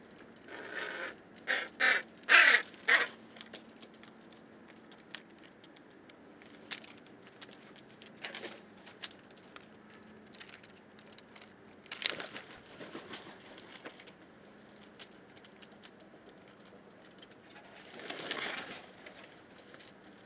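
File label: hooded crow corvus cornix cornix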